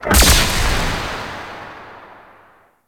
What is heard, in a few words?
Shell Pillar Spinning Ball Engage; Warframe; Corpus; Railjack; Comet Shredder